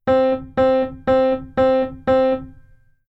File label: Keyboard Noise